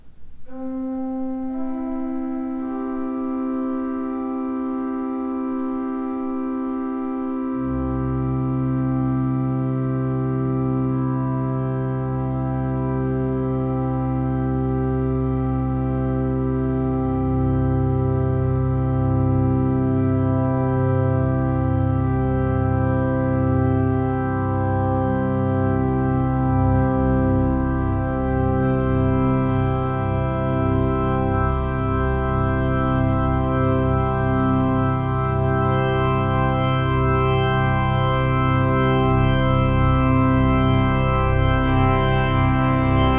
crescendo floor, pipe organs; EmmanuelCC

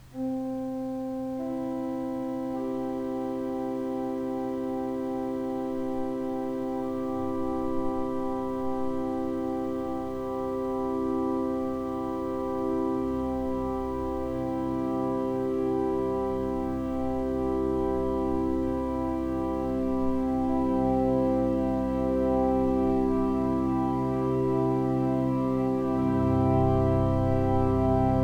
Crescendo Sforzando, pipe organs; mershon auditorium

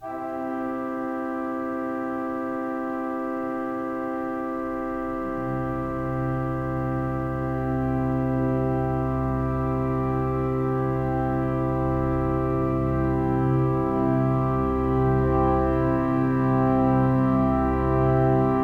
crescendo with sforz floor, pipe organs; Note the addition of the healthy sounding 16' Trombone rank at the end.

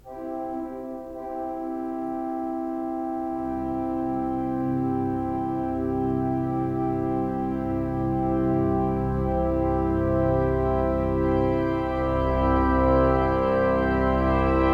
Crescendo Pedal, pipe organ; st john delphos cc

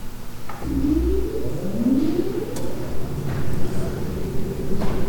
blower start, pipe organ; st john delphos cc

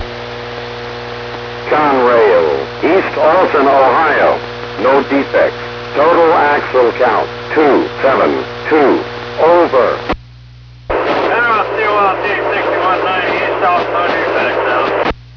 trains; Railroad; COLT pass the East Alton, OH detector on May 31.; crealton

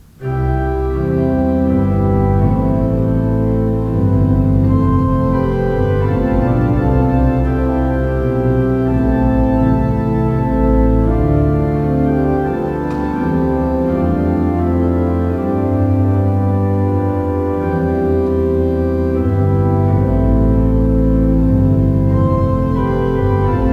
floor, pipe organs; EmmanuelCC

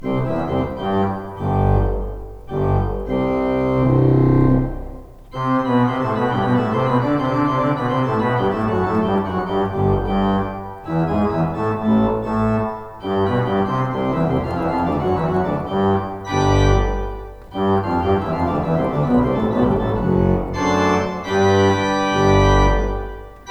pipe organs; FirstLondonUMC

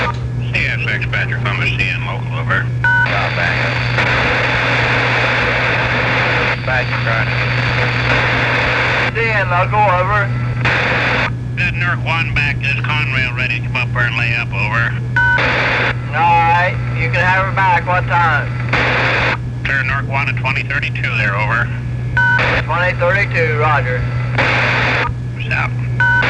cn local, trains; Railroad; Here we hear several things which no longer exist: CSX Columbus Dispatcher, C&N Local, and a Conrail reference.

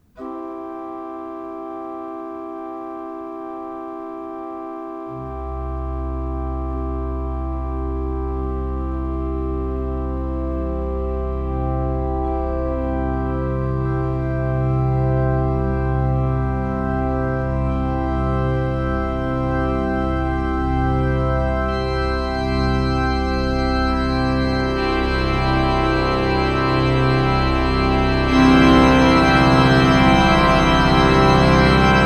crescendo loft, pipe organs; EmmanuelCC

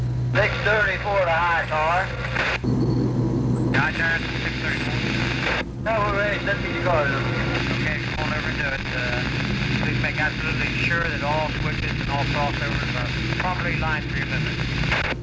hi tower, trains; Railroad; The yardmaster at CSX Parsons Yard used to be in four story building called "Hi-Tower".; Columbus

